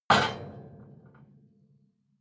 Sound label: metal impact iron ring rattle